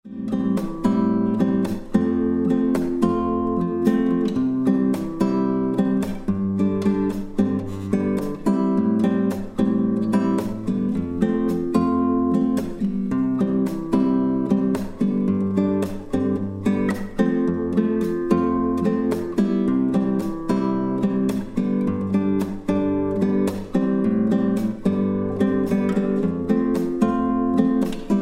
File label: Pop chords